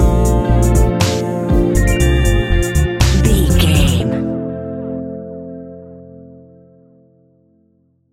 moonlit whispers stinger, A relaxed and chilling piece of music